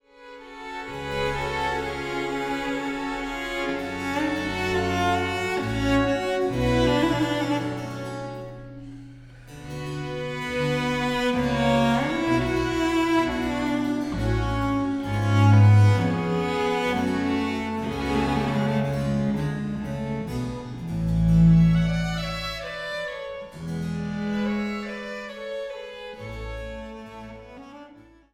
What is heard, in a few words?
Cello Concerto in G major; Ophelie Gaillard; Giovanni Battista Cirri; no.2; op.14 no.2; II. Largo